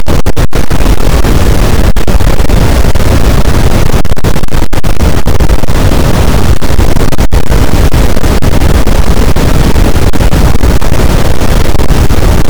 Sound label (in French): cachalot, boat; Physeter Macrocephalus